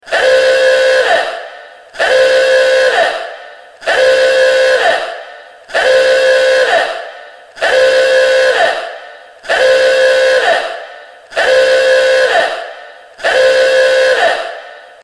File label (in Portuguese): alerta explosao